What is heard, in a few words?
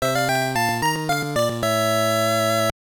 Pattern Clear (stage clear); quarth 1990 gb